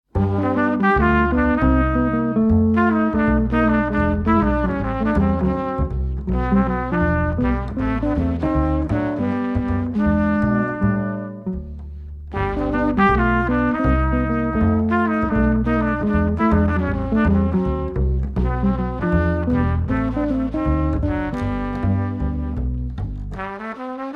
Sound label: trumpet